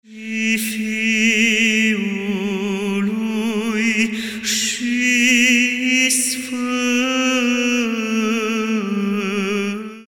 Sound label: Voice Quartet; Slava Tatalui; florilegiu